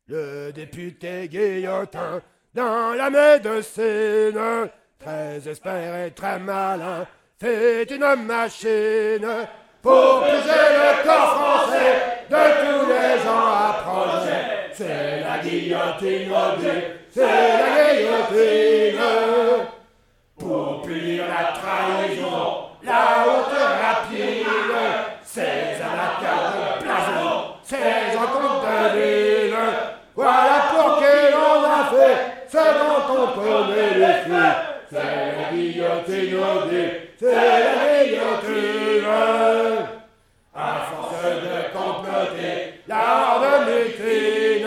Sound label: Choir; Assassin's Creed Unity